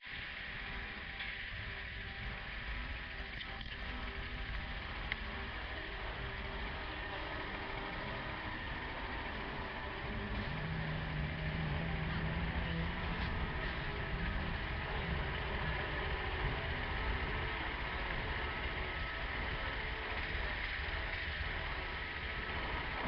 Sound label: guitar